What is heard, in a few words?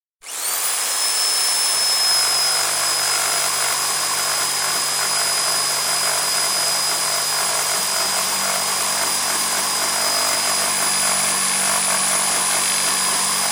Saws Electric, Electric Jigsaw operating; Machines; BBC